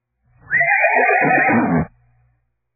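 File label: horse whinny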